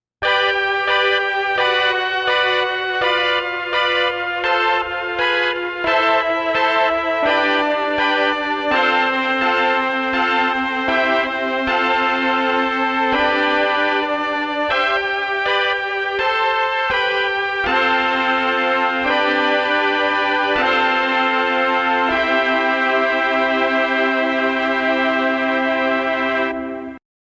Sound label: MK VI mkII Brass